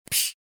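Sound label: hitstun small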